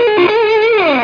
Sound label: Riff; thundertron ii